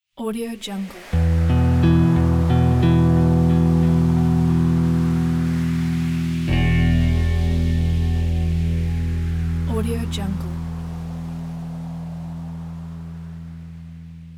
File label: dark ambient guitar ident